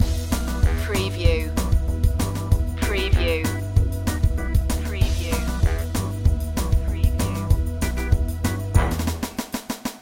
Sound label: the spooky pumpkin head loop